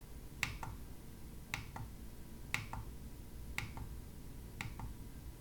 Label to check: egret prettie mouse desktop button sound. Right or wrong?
Right